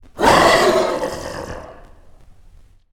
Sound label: Victory Monster Vox Death; Smite; Susano